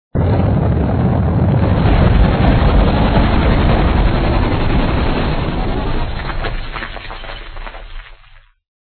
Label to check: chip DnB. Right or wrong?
Wrong